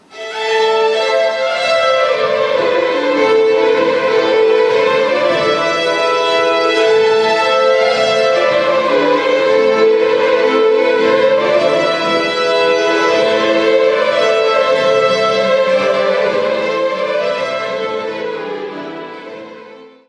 A-moll polska - Suede

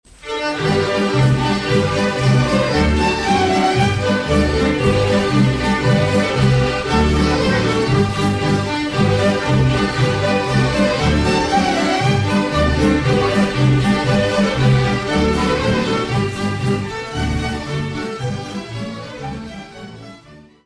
POLKA - Danemark

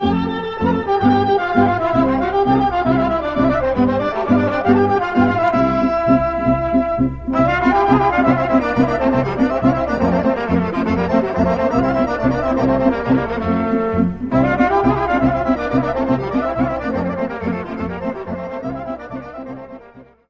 Rucenitsa - Bulgarie; Vodenicenska Rucenitsa

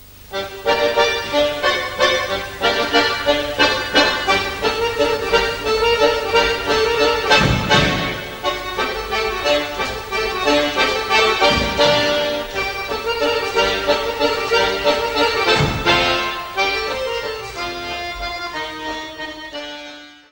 Java, Belgique

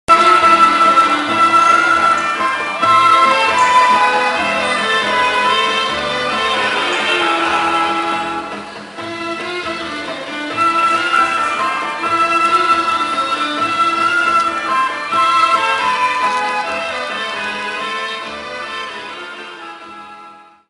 Zenska Siptarska Igra, Albanie

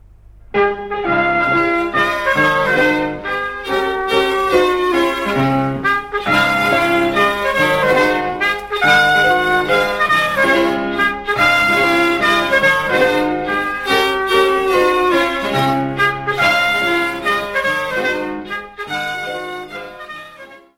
Valse - Belgique; Valse de Bastogne

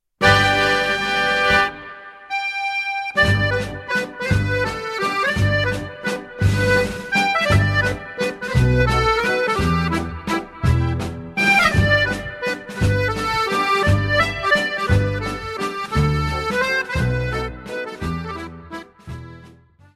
Waltz Country Dance - Ecosse

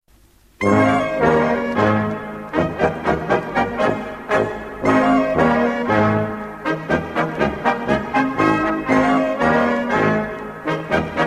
Galop - Allemagne

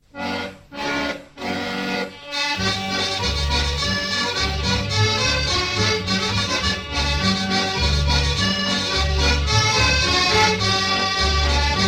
Polka - Belgique; Polka Celestine

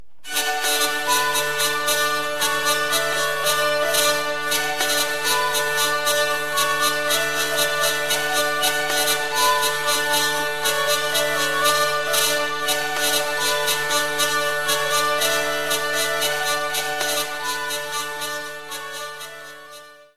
Maclotes - Belgique; Coo

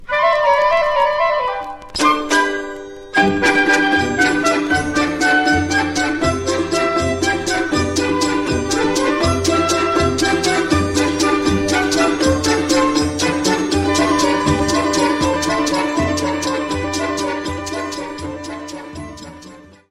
Vira, Portugal; Vira do Sitio

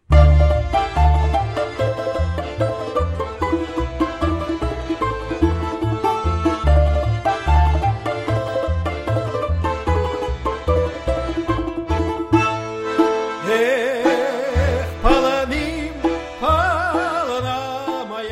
Korobuchka, Russie

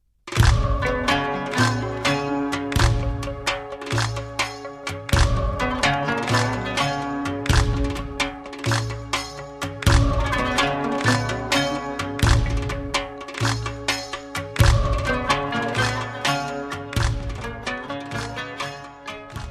Halay, Turquie

